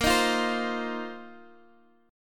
A sharp Suspended 2nd Suspended 4th A sharp down Guitar Open F; strummed